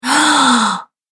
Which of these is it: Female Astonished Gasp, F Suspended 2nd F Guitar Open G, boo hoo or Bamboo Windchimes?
Female Astonished Gasp